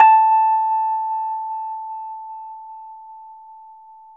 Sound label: Rhodes 4A; 3D Sound; 3D Keys VOL-1